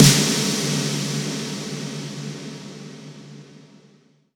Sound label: Impacts, Effects